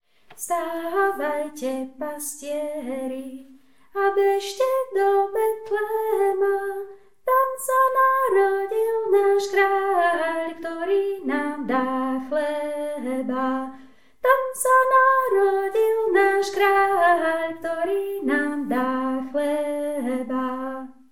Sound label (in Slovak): vstavajte 1 hlas; vstavajte pastieri; spevokol